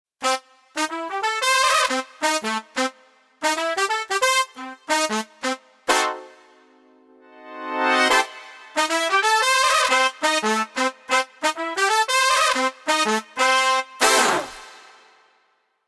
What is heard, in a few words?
Pop Horns bright